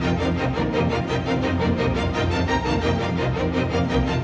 Strings; Sonic Foundry (Sony Creative Software); Orchestral VOL-2

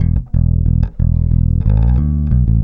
Rock, Bass; Bass X

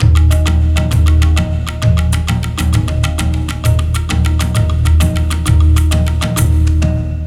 Percussion Combos; M-Audio Pro Sessions; Abstract World Fusion 2

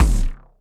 BD, Bassdrums, Roland TD-20

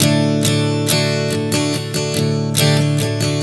Strum 070 D, One Chord Strumming 070 bpm

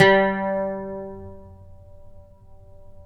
PLK OUD G; Roland - LA Composer vol. 3

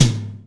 TOM TOM, Real Kit; Real-Kit s

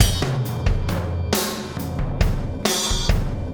Impact Crater Beat, Processed Drumkits

